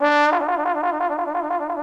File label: TROM DOIKS